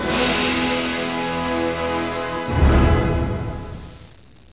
TROMBONE, ORCHHITS; MUSIC

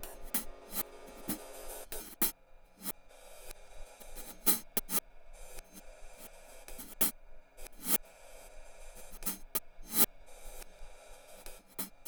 Cymbal Spits; Ambient Cinematic

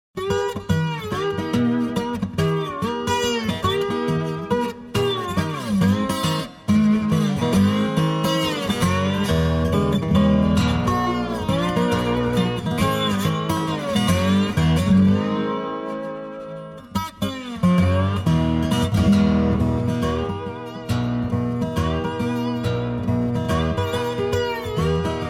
Guitar Music

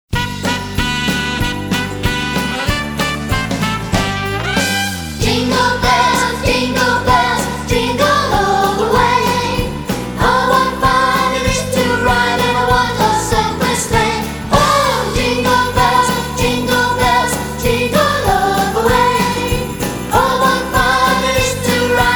Jingle Bells; CHILDRENS CHRISTMAS PARTY

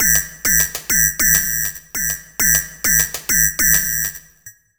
Beat, Concrete Beats

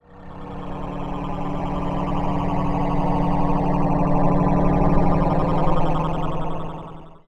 Lunar Atmo; Dance; SFX

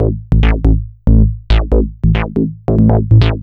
bassline, dance; Basses

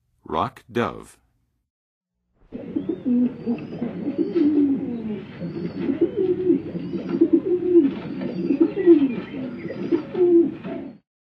Rock Dove; Nature Songs; N. America; Songs of Birds of North America; Western USA Songbirds

